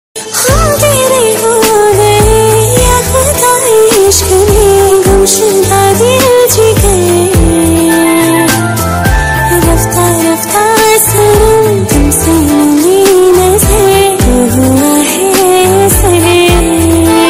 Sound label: Female Version Ringtone